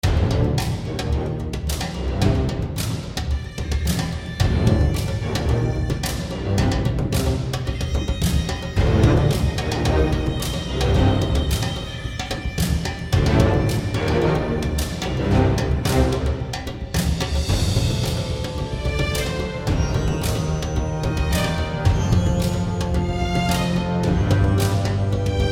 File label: Desert Battle